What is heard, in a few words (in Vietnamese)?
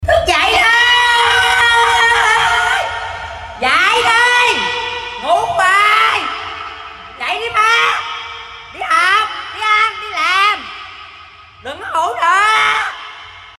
Bao Thuc Thuc Day Di